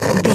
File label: radio tv electronic static